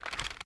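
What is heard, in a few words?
inspect movement, weapons; Valorant; marshall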